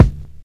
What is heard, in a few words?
Closed Hats